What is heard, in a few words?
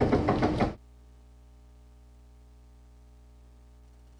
Motor 2, train; nankai6000; OpenBVE